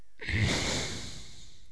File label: bull